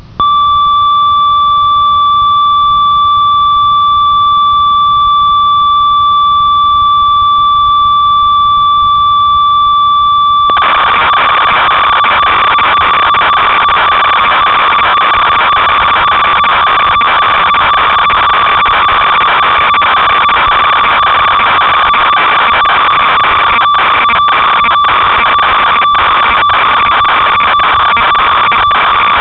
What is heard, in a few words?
ob6, synth